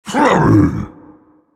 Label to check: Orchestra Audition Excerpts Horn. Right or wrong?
Wrong